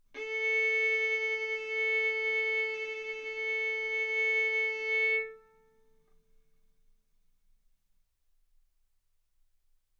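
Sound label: Violin arco mf A string A4 B4 stereo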